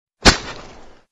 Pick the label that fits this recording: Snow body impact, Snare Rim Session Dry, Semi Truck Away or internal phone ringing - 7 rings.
Snow body impact